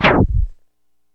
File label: helmet sound, science fiction; E-MU Producer Series Vol. 3 – Hollywood Sound Effects; Mower Man